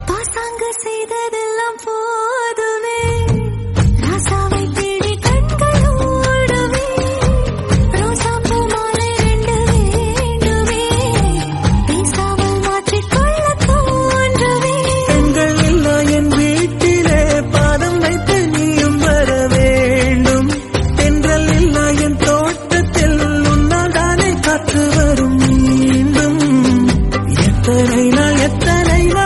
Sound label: female version; nimir